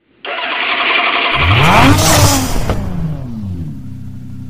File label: tuning car starts; ringtones; sms